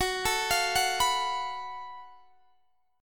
F sharp Minor Major 7th Double Flat 5th, guitar; arpeggiated; x,21,19,22,19,19

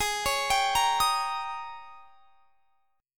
Ab Major 7th Suspended 2nd Suspended 4th Ab, down; AbM7sus2sus4 arpeggiated

